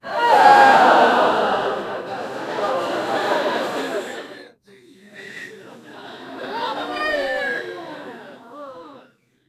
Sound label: studio audience gasps